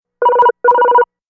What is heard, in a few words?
office ringer